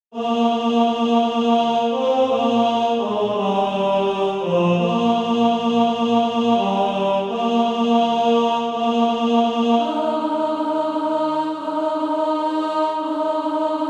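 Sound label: tenor; SATB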